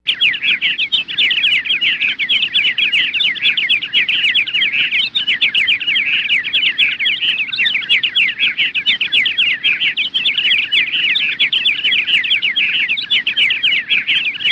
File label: Knockout Chirp Bir, animals bird bgs